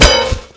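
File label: grass; Mafie 1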